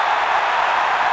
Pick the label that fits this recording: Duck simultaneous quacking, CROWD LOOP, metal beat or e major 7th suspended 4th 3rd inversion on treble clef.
CROWD LOOP